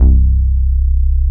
BASS, Drum & Bass, 180 BPM; Ueberschall; Drum & Bass Flash Trackz

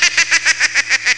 laugh; ST-XX Amiga Soundtracker Sample Packs

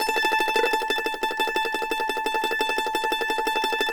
MANDO TREM A5, Whole Lotta Country; Sampleheads; Whole Lotta Country 1 & 2

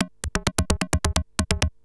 Beats, Industrial

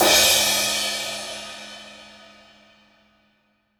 18 CYM CR, ROCK CRASH

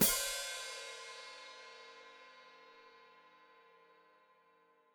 Hat Closed Open, Hi-Hat

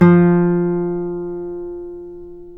guitar classical guitar, nylon string; GTR; Roland LCDP02 Guitar and Bass

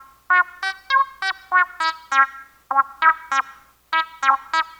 Bleep Synth; Sonic Foundry (Sony Creative Software); Stylus Pressure Urban Grooves on Digital Wax; Bleep Out

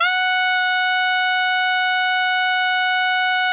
alto FF F#4, saxophones; Greytsounds; Philip Wolfe David Crozier's Saxophones

